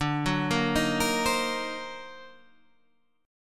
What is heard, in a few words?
D sharp Minor Major 13th D